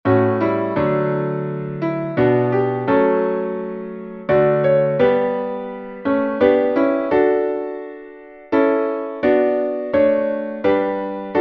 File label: Piano Chorale